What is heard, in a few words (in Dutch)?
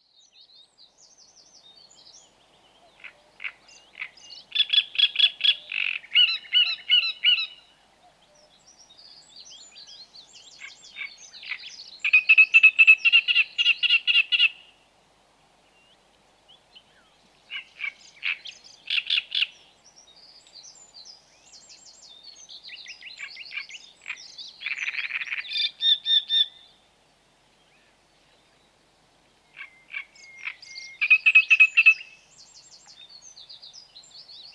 Grote karekiet